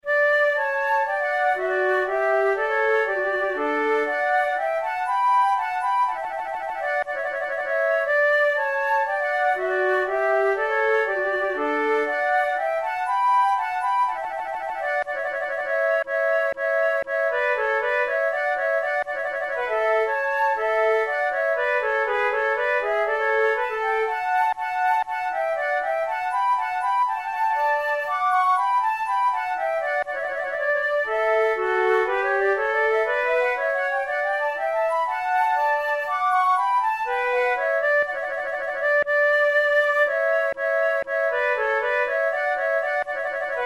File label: flute